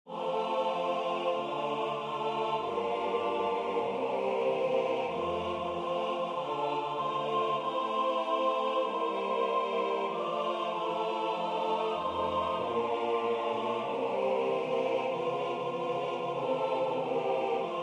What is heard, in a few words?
Swing low sweet chariot SATB